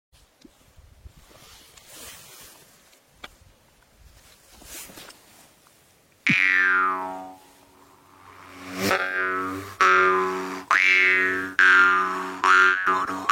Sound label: Mouth harp s Arpa de boca freestyle